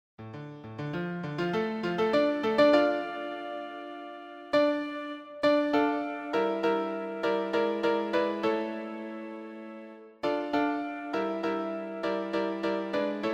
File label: piano